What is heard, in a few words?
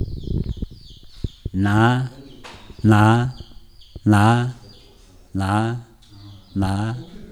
sniff smell